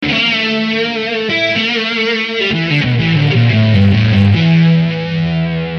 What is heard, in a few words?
Em 080 120 solo